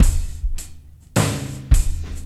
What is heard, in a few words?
DRM, Breakbeat